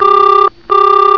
TEL BRBR, ALARMS

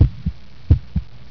heart

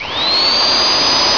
DRILL ON, MACHINES

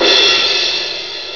CLASH, IMPACTS

